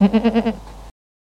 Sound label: Goat Baah, Neigh; goats